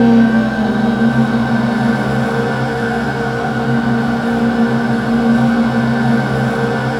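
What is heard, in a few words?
ATM HORROR, Production Effects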